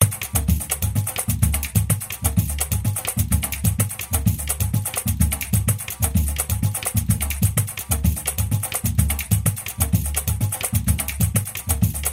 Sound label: Percussion